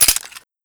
Reload Wait; Weapon Package FortWars

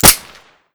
SD Fire Local; Weapon Package FortWars

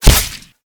impact Killshot; Weapon Package FortWars

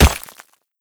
bullet Impact; Weapon Package FortWars

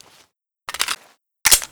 Reload Start; Weapon Package FortWars

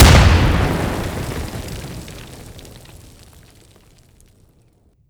grenade Detonate; Weapon Package FortWars

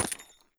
grenade Bounce; Weapon Package FortWars

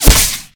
impact Killshot; Weapon Package FortWars

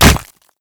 bullet Impact; Weapon Package FortWars

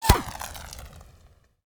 impact Sweetener; Weapon Package FortWars

